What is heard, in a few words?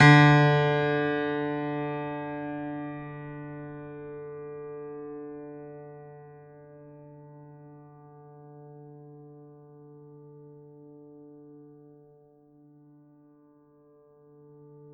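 Classical Piano D3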